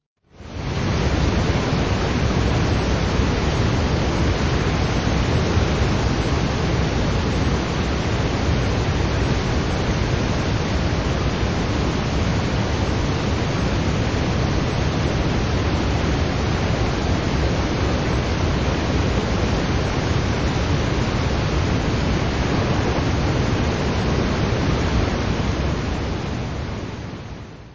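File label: big waterfall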